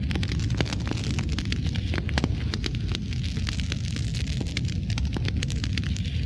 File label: burn; Mega Game; Team Alligator